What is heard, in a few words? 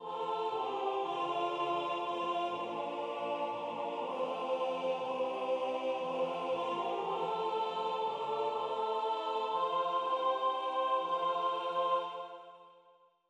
Mezzo Soprano; Revecy venir du printans Rechant